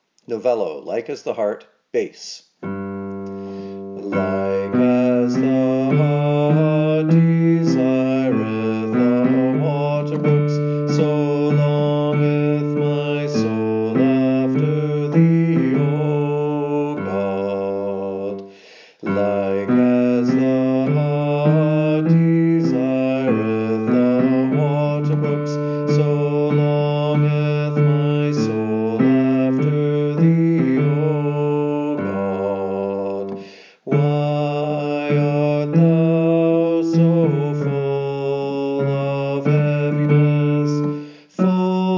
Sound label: Bass; Novello; Like as the hart